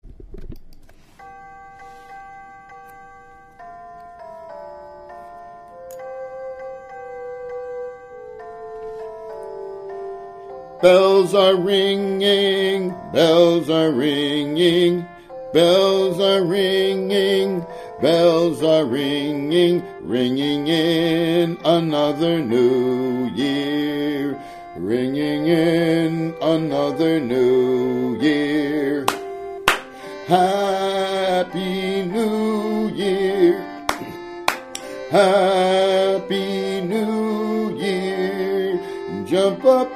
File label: Vocals